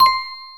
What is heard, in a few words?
answer ok; Orienteering